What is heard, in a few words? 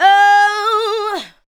ADLIBS